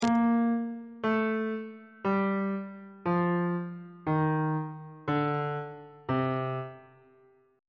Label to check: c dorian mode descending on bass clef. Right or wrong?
Right